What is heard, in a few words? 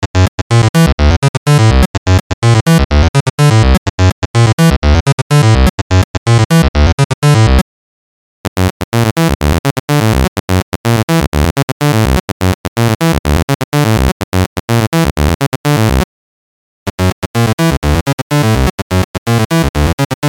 Pulse; IL Harmor; Harmor DE MZ